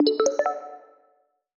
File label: New Item Get; the outbound ghost